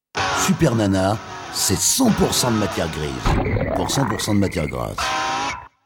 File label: jingle; supernana; centpourcent